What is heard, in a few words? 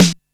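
Snare Acoustic, Drums, Roland MT32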